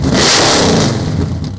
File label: Mustang GT350 Rev, Automobiles